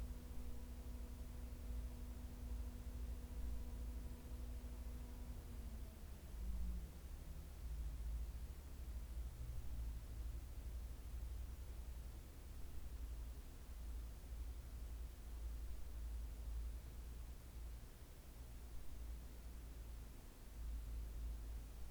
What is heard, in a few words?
saw shuts down